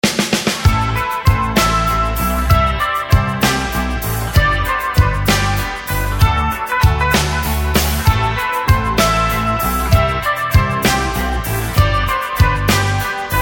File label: happy music